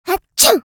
Sneeze vocalization girl child kid

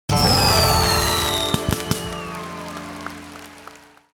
Casino slot machine jackpot big win

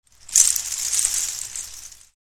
Beaded door curtain sway rattle movement; blinds

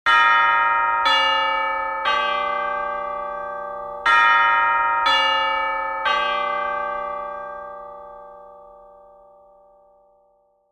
Church bells ringing chimes funeral; large bell

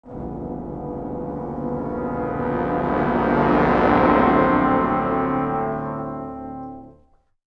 Cartoon suspense brass rising tension; cartoon ascend

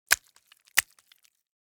Fish flop slap on boat

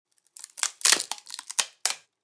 Cracking wood

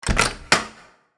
Large cottage door open

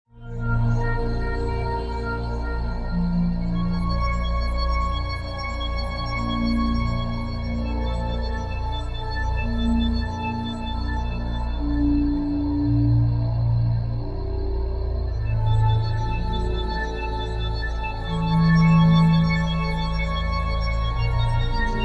Big rise drone dark horror dramatic

